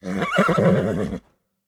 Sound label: mvt pet vocal; smite; lancelot